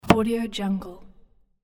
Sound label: cutting vegetables